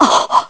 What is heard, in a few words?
Surprised; Link; zelda